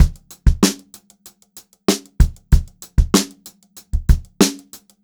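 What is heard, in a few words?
STRAIGHT BEAT, Funk-Groove Drumloops; USB Soundscan vol.38; AKAI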